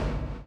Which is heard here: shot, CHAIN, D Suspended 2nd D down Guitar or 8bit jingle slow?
CHAIN